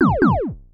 Sci fi

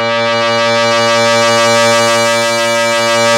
2600 G3, ARP 2600; Hollywood Edge - Giorgio Moroder Rare Synthesizer Collection

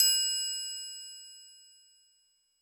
U220 FX Set, Drum Machines; Roland U220

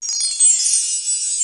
Wind Chimes

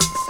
HH, HIHAT HIP; Best Service Dance Mega Drums

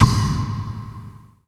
movie, impacts & hits; Techno Trance Essentials

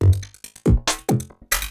LOOP, 140 BPM, Drum & Bass; Ueberschall; Drum & Bass Resonance

